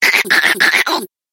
monster imp

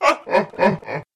monster gorgon

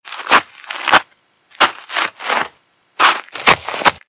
tradeskill planting

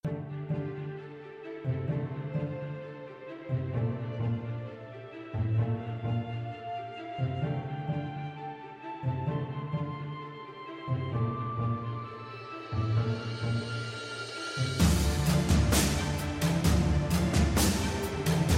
misc volcano

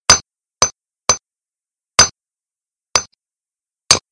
tradeskill mining

